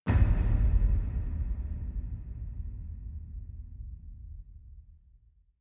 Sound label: scifi efx